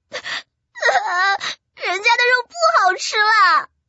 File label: fish die